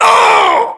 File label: death, english